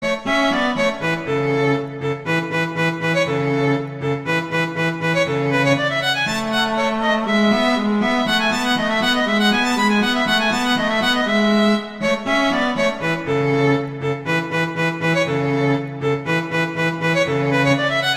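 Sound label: Duet, horn; Mozart; Duets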